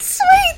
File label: leveling